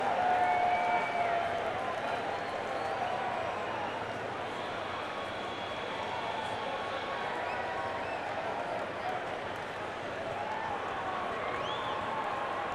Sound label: Crowd, Madison Square Garden; New York, NY